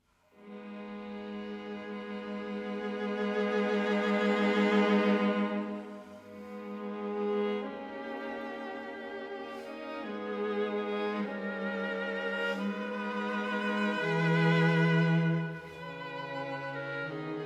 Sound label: String Quartet VI. Adagio quasi un poco anda; Beethoven String Quartet No. 14 in C sharp minor, Op. 131